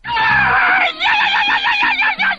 "ah yi yi yi yi yi"; Voice; ooh ahh

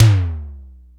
ichabod, Toms Electro

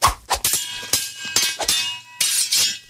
sword

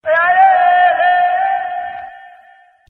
ay distant arab man SUNG, ooh ahh; Voice

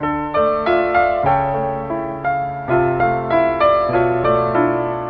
piano, Sad

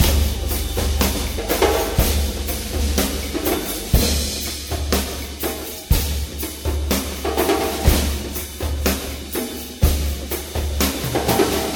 new break, Drum loops

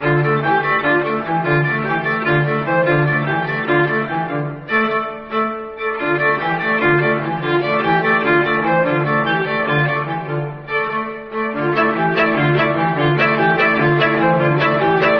strings absolute chaos, Sad; Loops

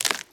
bone break

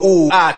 ooh ahh, Voice

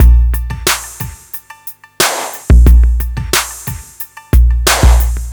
Drum loops Electro

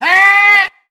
heh, Voice; ooh ahh

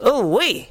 "ooh wee", voice

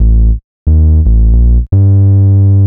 bass, Loops

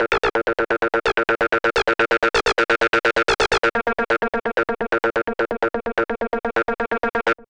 scissor; Loops; Electronic

